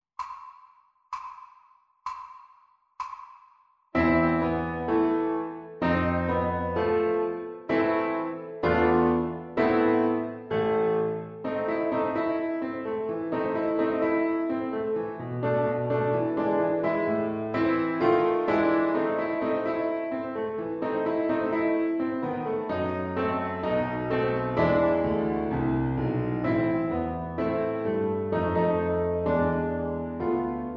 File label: Piano Backing Track; ViolinSchool